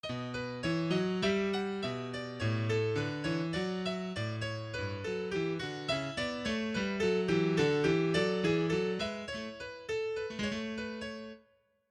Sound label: hold pedal